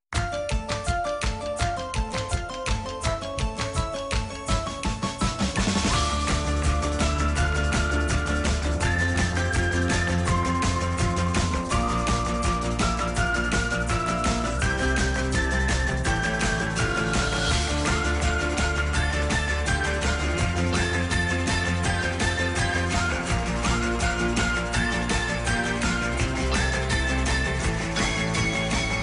khong loi sony gravity feeling

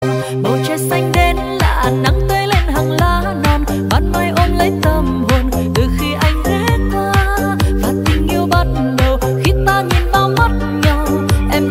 nhac chuong remix giong nu hat

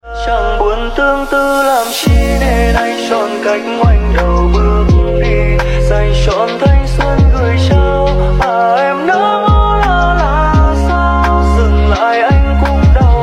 nhac chuong lofi clock

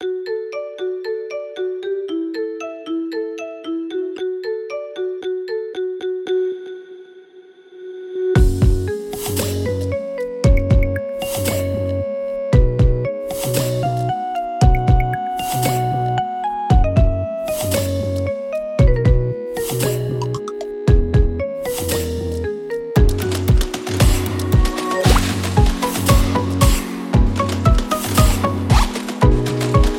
khong loi xiaomi vande mataram

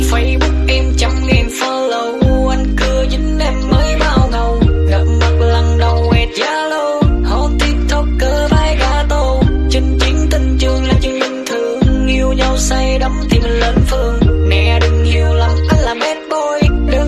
Nhac Chuong Lofi Ver

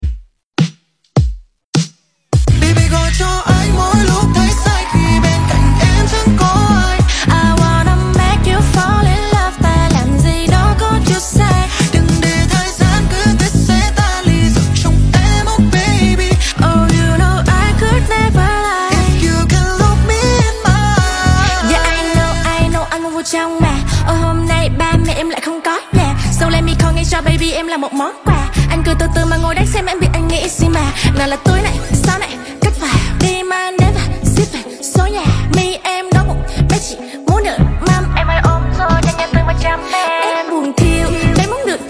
rap viet

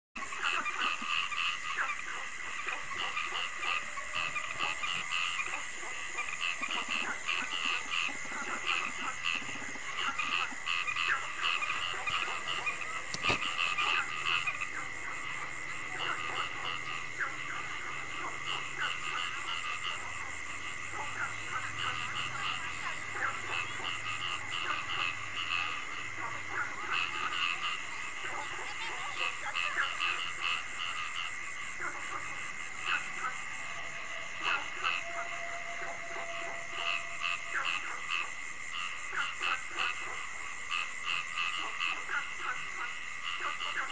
Tieng Ech Nhai keu ban dem

